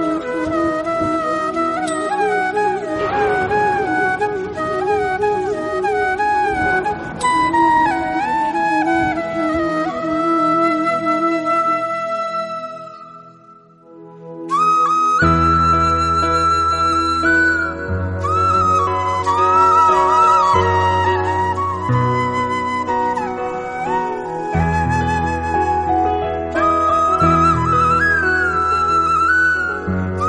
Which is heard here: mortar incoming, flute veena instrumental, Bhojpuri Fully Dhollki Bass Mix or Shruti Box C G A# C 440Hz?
flute veena instrumental